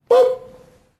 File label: boop